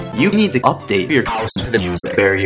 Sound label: music bar